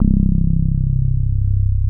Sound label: bass, Drum & Bass, 140 BPM; Ueberschall; Drum & Bass Resonance